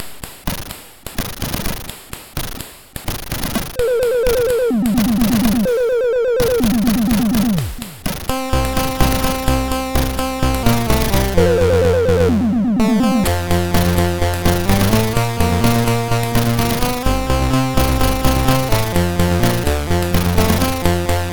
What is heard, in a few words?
Remix, MOS6581R4